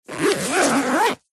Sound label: foley luggage rucksack backpack pocket zipper; zapsplat